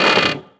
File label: pipe swing; Tony Hawk's Project 8; XBOX